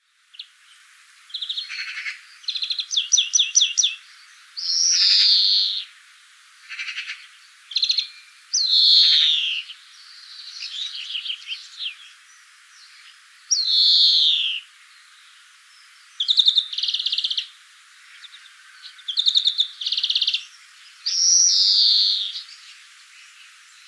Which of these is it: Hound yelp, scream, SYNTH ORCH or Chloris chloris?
Chloris chloris